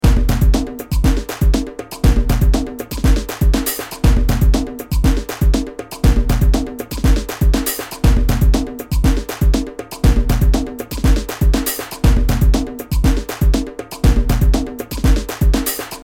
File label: Sample loops